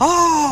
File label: ah curious; ooh ahh